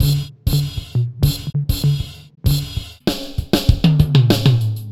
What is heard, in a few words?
Drums; Sonic Foundry (Sony Creative Software) - Stylus Pressure Urban Grooves on Digital Wax